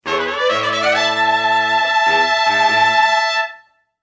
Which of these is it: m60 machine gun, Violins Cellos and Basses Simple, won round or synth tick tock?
won round